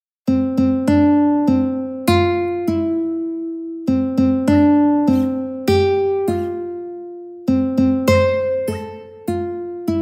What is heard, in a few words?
happy birthday ukulele